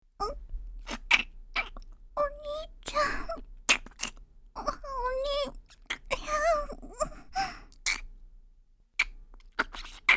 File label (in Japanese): キス (ノーマル キス)